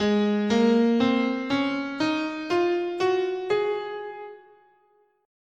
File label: G sharp Mixolydian, scale